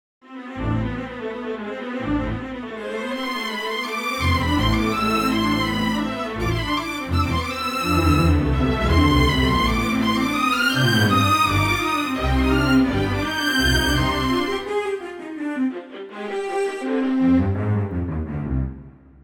test solos Drier, strings; Harry Potter

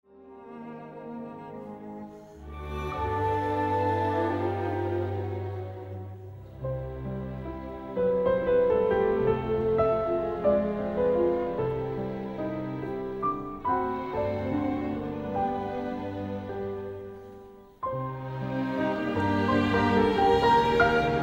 Piano; Grieg; orchestral context

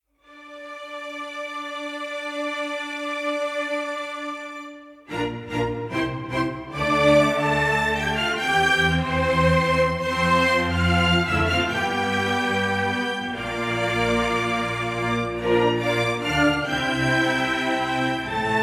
strings; B2 reverb

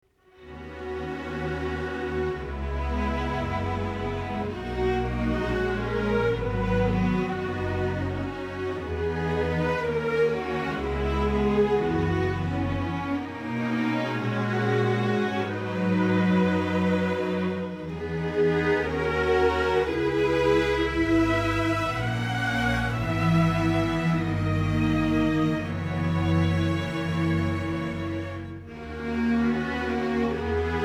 strings only

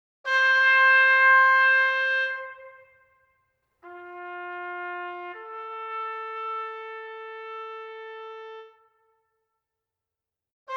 Trumpet; orchestral mix

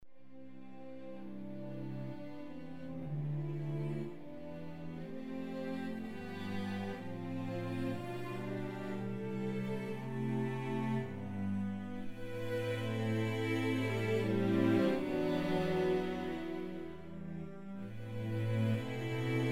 Strgs; Sonivox orchestral companion

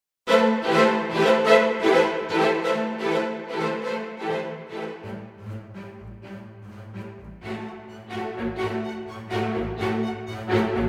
spiccato; fast spicatto lines

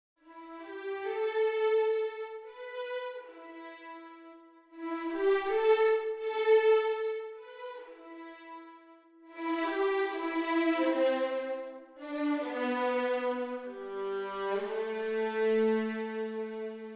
Strings Position Test Violins; aether

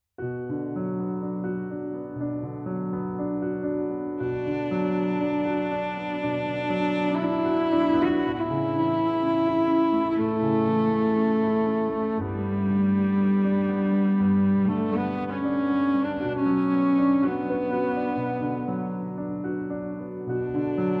Cello; Potter; Vsl

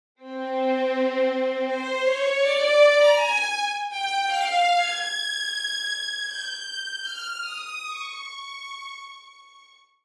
Violins, strings; LASS; SPAT; 3m

